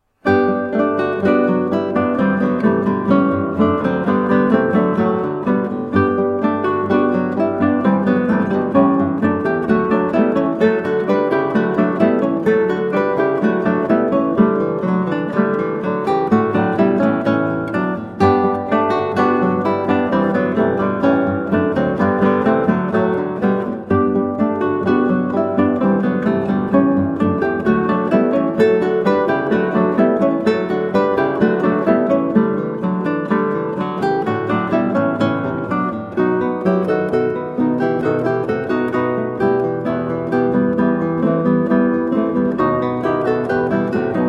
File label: Concerto in G Major Allegro, spoken, 8 String Guitar; Baroque Concertos; RV151; Antonio Vivaldi